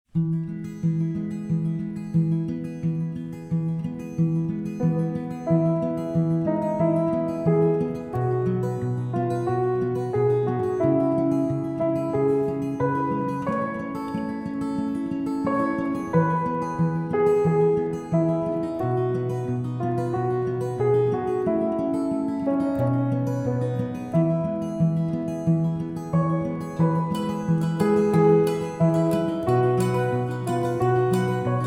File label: Auld Lang Syne Instrumental